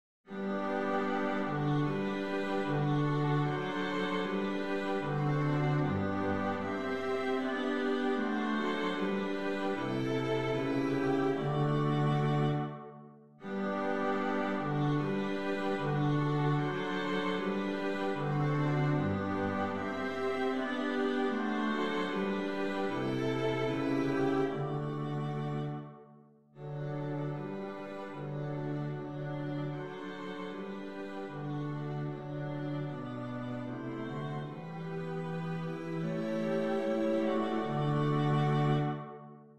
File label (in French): hymne national allemand; haydn joseph